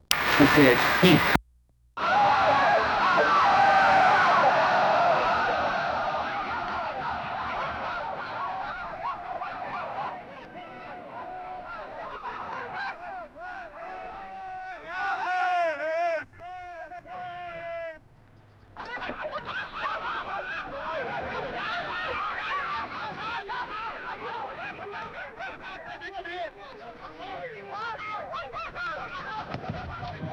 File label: chaotic village chase